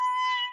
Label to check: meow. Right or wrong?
Right